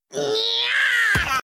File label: NYAAAAAHHHH